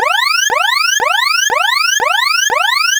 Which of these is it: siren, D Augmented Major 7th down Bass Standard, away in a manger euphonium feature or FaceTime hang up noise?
siren